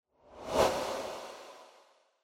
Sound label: Camera Motion, High Effect; シーン・カメラモーション